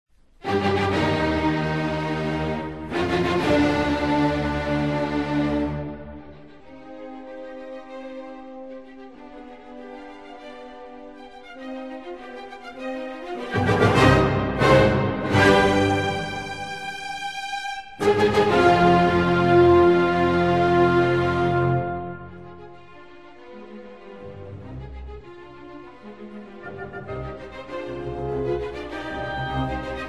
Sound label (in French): Symphonie; 1er mouvement; Beethoven